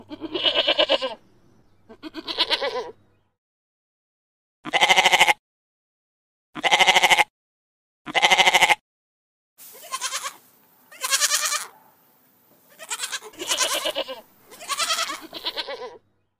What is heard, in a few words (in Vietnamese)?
tiếng dê kêu liên tục nhiều con